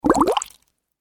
Water Bubble Surface Single; Nature

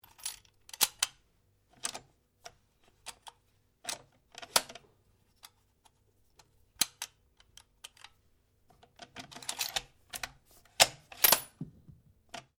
RIFLE REMMINGTON700 7.62MM LOADING SEQUENCE; A selection of domesttic firearms; Rifles; Guns

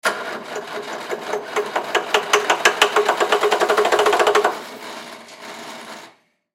Forklift Start Stall; Start Up Rumble Clicks Stall; Machinery; Machines; INDUSTRY; Blastwave

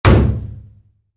Wood hit metal heavy, Wood, Impact: Metal, Heavy; Materials; Stuart Duffield

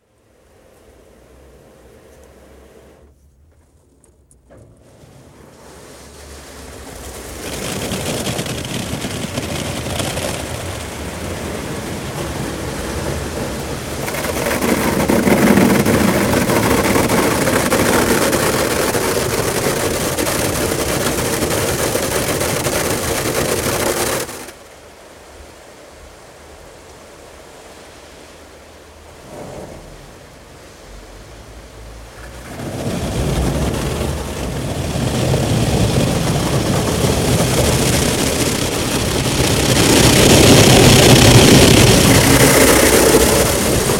Car Wash (Recorded inside car); Garage Sound Effects; BBC

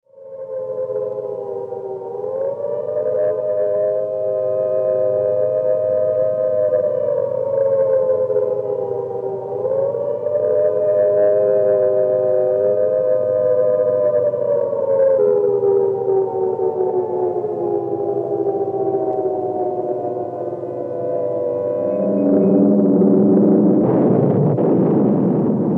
Air Raid Sirens Bombs London; 1942; Siren Sound Effects

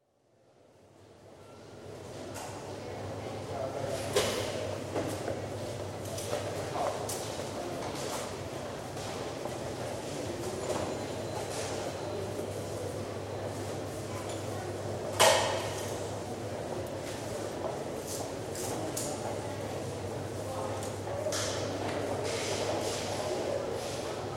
Cash Registers; BBC

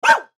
Dog Wheaten Terrier Bark; Animal; Dog Sound Effects

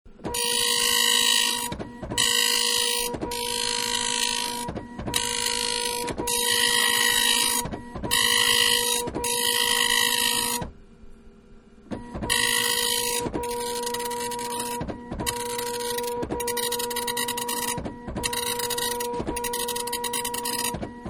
Printer Printing, Computer; Computers (Sound Effects:Electronics); Manhattan Production Music

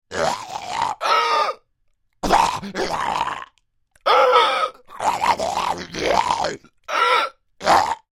Creature Troll Yelps Pain Distress, Monster; Monsters (Sound Effects:Horror); Big Room Sound

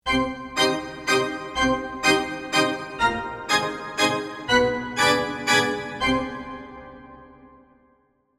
Organ Haunted, Cathedral, Organ, Rhythm; Horror, Musical, Haunted, Mansion; Scary Soundscape; BlastWave

